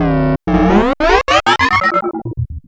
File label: Space Sound Losing Control